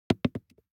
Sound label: DICE on Plastic, Throw and Roll, Foam, 1 One Die; Samples; ArticulatedSounds; DICE Sfx Party (Extended)